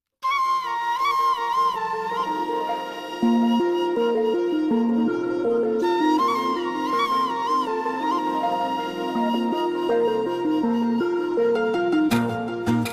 romantic song love ringtone love music ringtone bgm love; challenging star; katera